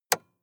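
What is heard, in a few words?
sports car interior switch on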